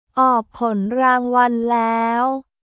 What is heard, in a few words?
reward